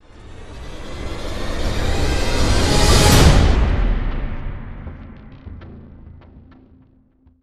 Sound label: SWELL